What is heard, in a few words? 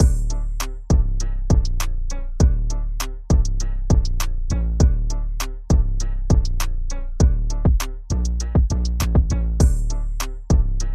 aristocrat Drums; bigbluebutton